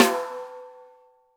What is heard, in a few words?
Snare, Roland TD-20